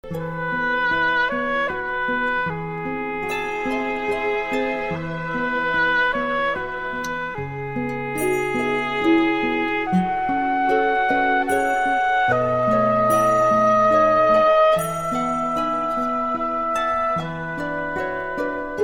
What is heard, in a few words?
silent night, oboe